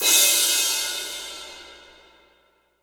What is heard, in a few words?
IMP CYM CRASH, Cinematic Impact; M-Audio Pro Sessions; Liquid Cinema; Drum